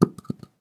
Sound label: Shell 12ga carpet; STALKER; IWP